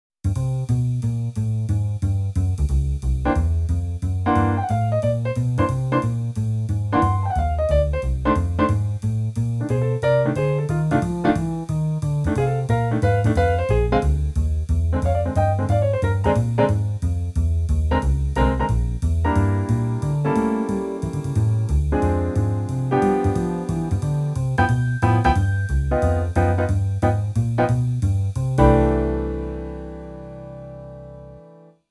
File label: kawai ca501 wood bass ride